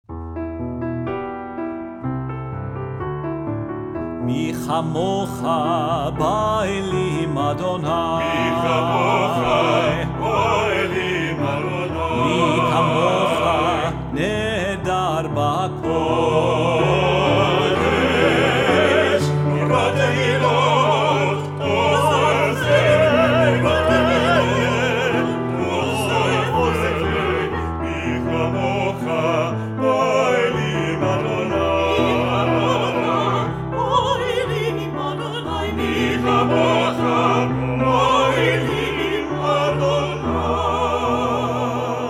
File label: Traditional Michamocha